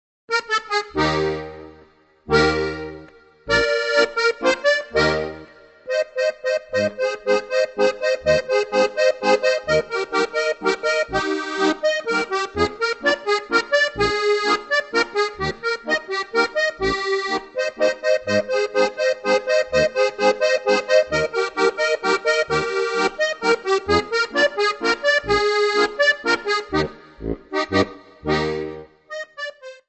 walzer plattler

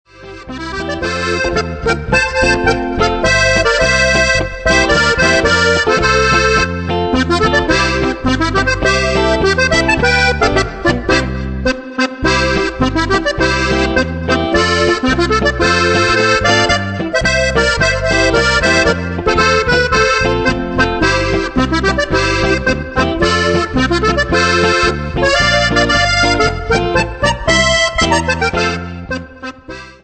polka

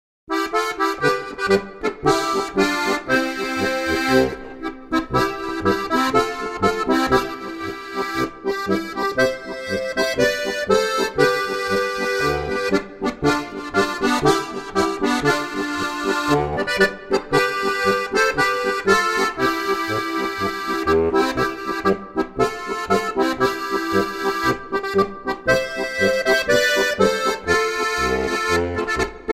polka

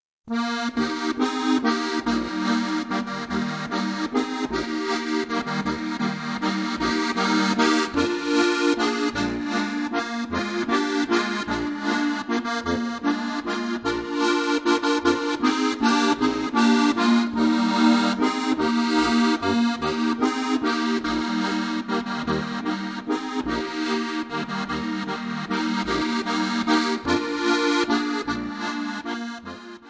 walzer

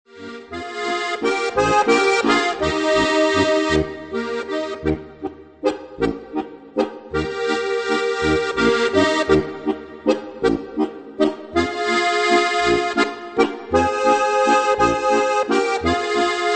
walzer

